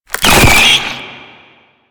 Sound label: Weakpoint Appear; Warframe; Nineteeen Ninety Nine; Infested Survival